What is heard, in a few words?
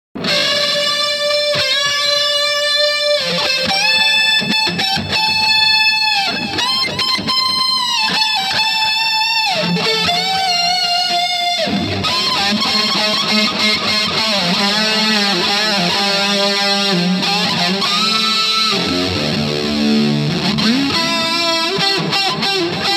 Power Boost Rams Head through Reeves high guitar vol